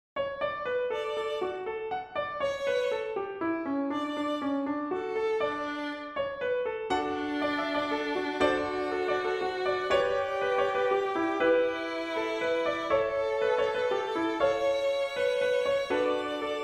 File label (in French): valse clogue; Latour